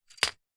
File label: leisure game disc drop into grid tower game play single; connect 4